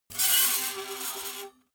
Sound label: metal screech phaser fast